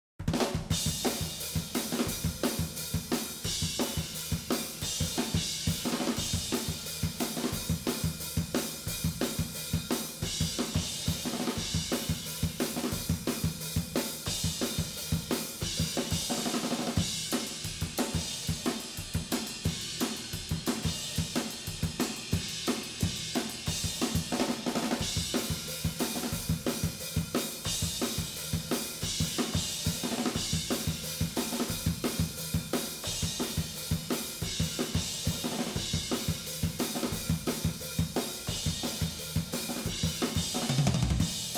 Cymbals

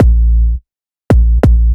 KICK

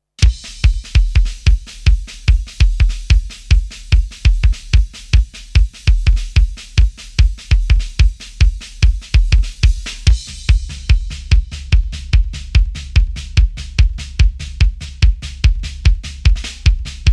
drums

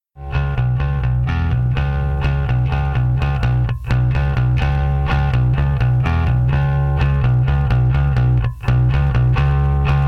rhythm

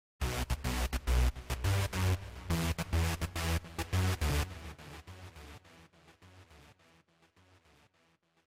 Synth; Remix Sample Pack

